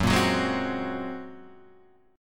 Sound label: A sharp 7th Suspended 2nd Sharp 5th